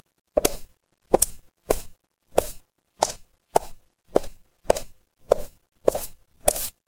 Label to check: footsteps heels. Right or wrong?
Right